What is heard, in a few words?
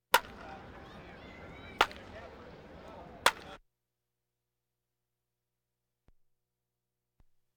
Crowds Race cars Baseball